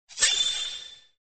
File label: big win